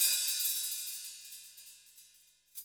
HIHAT; Best Service; Hyper Dance; CONSTRUCT

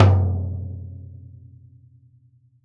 velocity tom; VELOCITY TOM 1

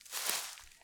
Rustly Step, Footsteps, outdoor

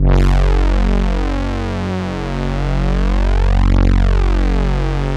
Elka Synthex Brass

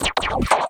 SFX, 180 BPM, Drum & Bass; Ueberschall; Drum & Bass Flash Trackz

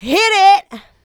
Female Vocals; Houseworx

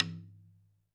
Roland TD-20 Percussion

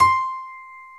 PNO, SY PIANO, House

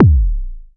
MS-20 Kick

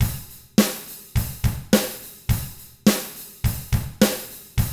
105 POP RK, Drums; ILIO; Double Platinum Drums 1

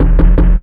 Ragga & Dub Reggae PERC; USB Soundscan vol.27; AKAI

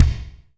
Reverb Kick Snare; PS-37 Dance Synths; Percussion

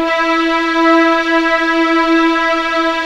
E4 EXP MF, VIOLINS; East West; The Ultimate String Collection

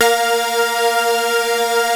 Prophet 5 F5; Hollywood Edge - Giorgio Moroder Rare Synthesizer Collection

